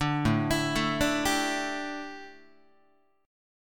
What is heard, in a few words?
A 7th Suspended 4th down Guitar, chord; A7sus4 arpeggiated